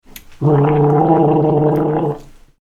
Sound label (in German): Gurgeln